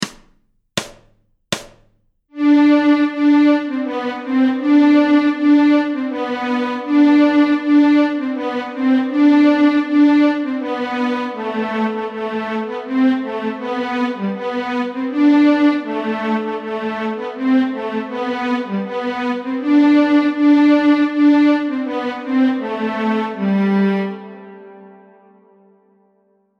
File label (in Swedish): Stråk G, Nyckelharpa; Tredansen; Notträning